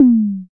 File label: electro perc; Hip Hop; STYLE KITS (Hip Hop, Etc); Precise Electro Percussion